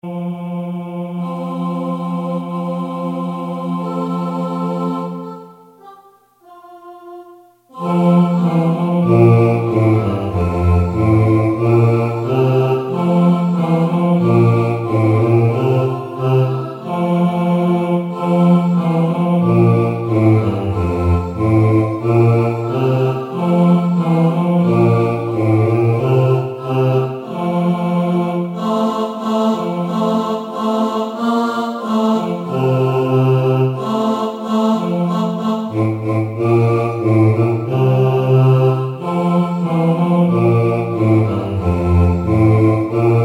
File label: BASS